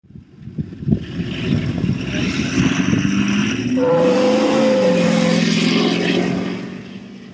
ford takeoff

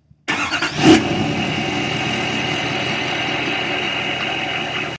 lml straight start

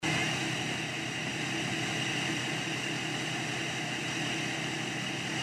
lml straight idle

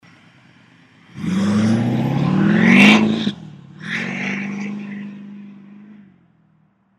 dmax launch

